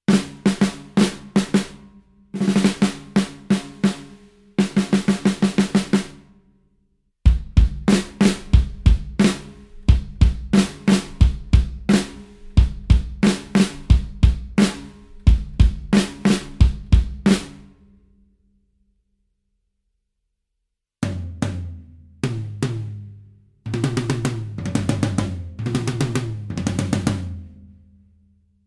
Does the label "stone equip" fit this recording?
No